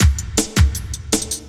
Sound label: LOOP, Drum & Bass, 160 BPM